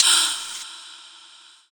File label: Fx Vox; Equipped Music; Loungin House